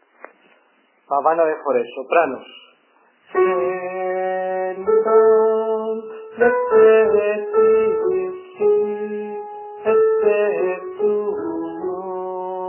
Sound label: pavana sop; faure